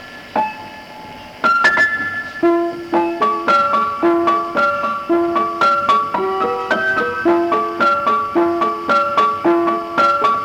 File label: wreckage piano